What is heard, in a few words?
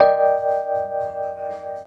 HEY, Roots Disco; USB Soundscan vol.32; AKAI